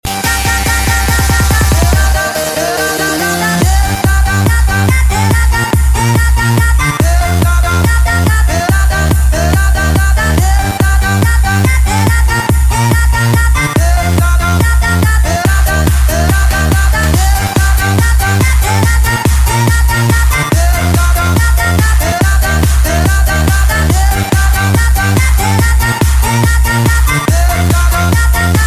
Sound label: Techno